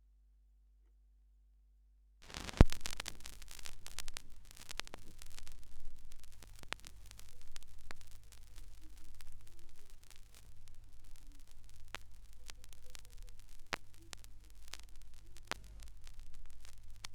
schallplattengeraeusche

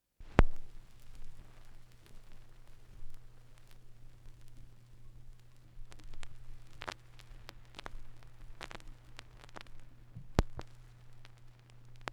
schallplattengeraeusche

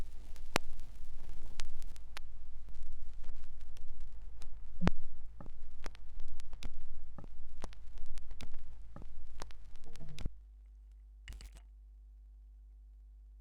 schallplattengeraeusche

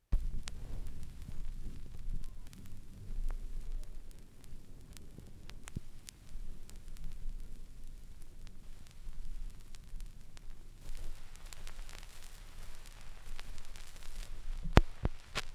schallplattengeraeusche

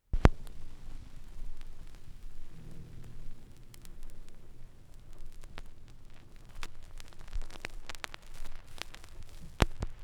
schallplattengeraeusche

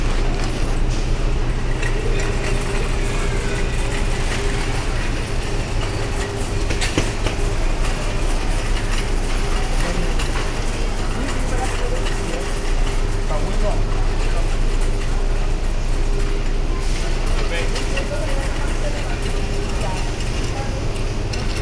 supermarkt, Brasilien

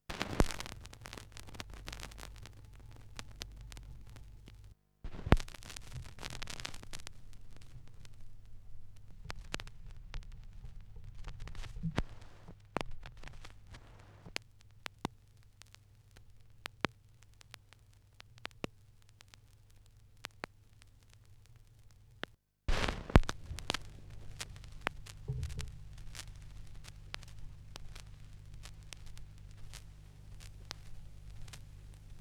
schallplattengeraeusche

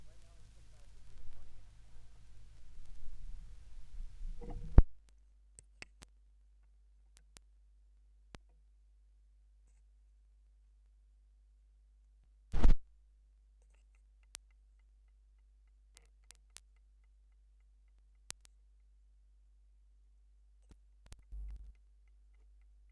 schallplattengeraeusche

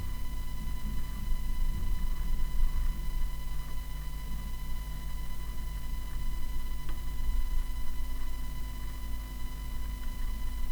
kuehlgetraet zuhause; kuehlgeraet zuhause

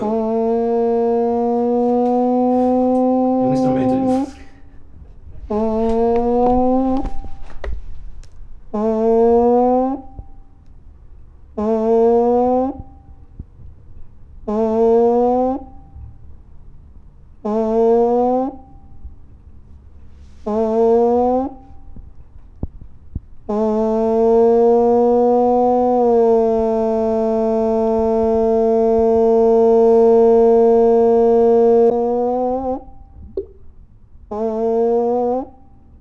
Dusche; Brasilien; Bertonis Dusche

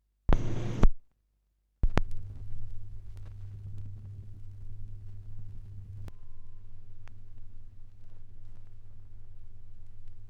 schallplattengeraeusche, 16rpm

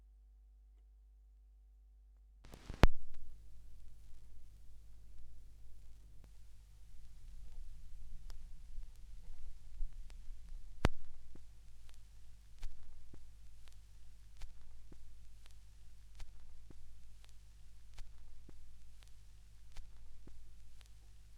Schallplattengeraeusche

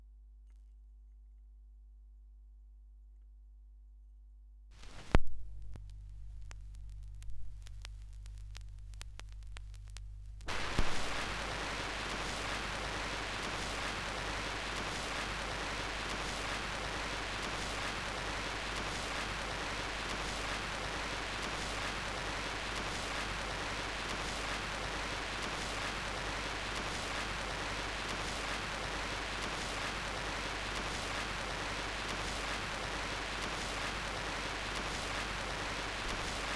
schallplattengeraeusche

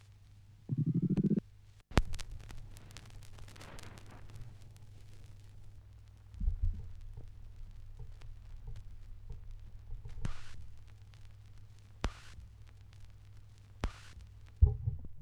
schallplattengeraeusche

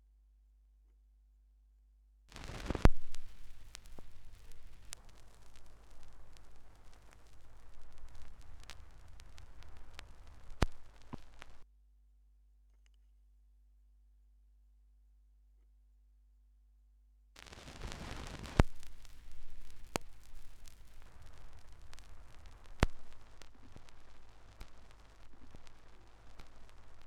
schallplattengeraeusche

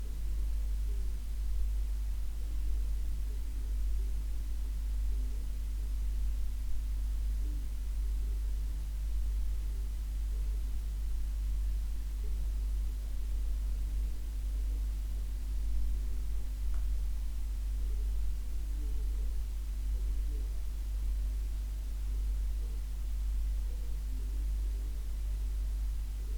kuehlgetraet zu hause; kuehlgeraet zu hause

